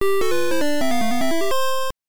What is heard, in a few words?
All Gold Collected; Lode Runner; NES